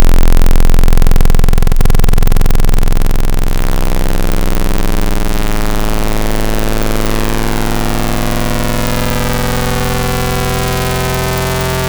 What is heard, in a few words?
Fm Gime Saw, Serum Wavetables; Xfer Serum; Synthetic's Serum Collection; Cymatics - Nuclear for Xfer Serum Wavetables